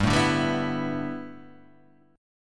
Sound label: A 7th Suspended 2nd Suspended 4th